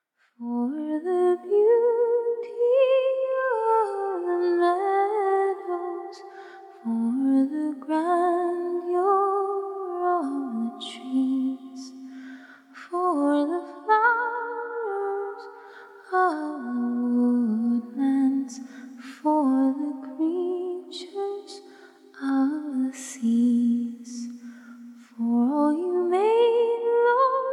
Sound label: acapella hymns